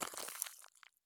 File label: walk gravel; Escape from Tarkov